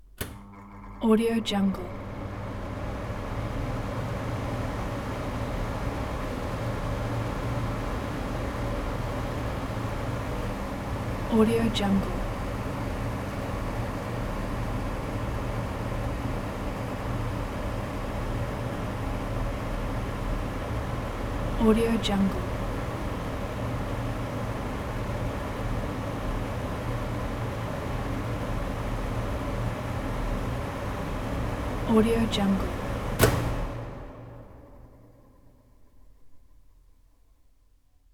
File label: kitchen hood vent; akensound